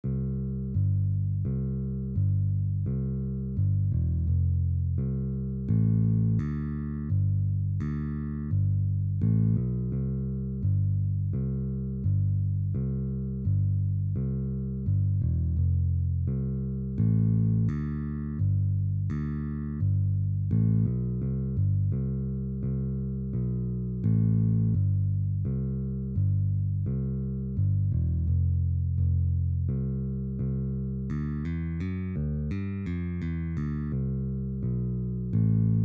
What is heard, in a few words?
ensemble jingle bells bass